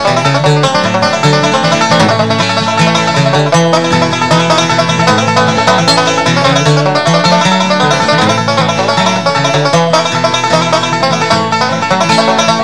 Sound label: banjo